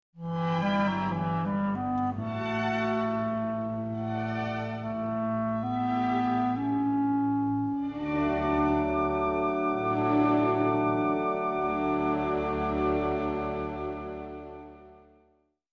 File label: concerto for clarinet adagio